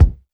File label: tight lows kickdrum sound C key; tuned drums